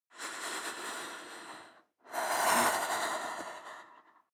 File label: breath female shiver